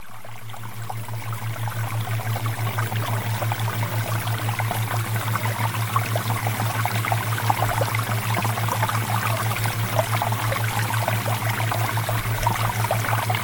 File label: Water Theta Waves